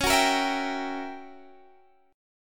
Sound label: Fsharp 7th Flat 9th, guitar chord